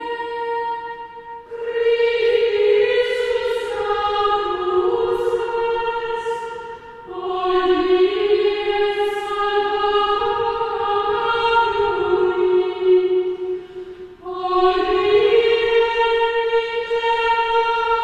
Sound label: Choir, Chant; Antiphon from Chester Processional; Christmas